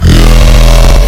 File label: yaaaaaa